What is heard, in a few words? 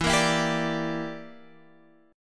E Minor 7th Flat 5th; chord shape